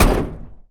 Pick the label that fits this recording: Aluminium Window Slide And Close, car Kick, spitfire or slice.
car Kick